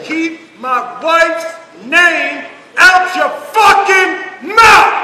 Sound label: screaming; will smith